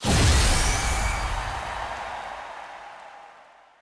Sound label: ui map finish expert